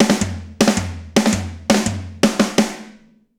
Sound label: DRUMFILL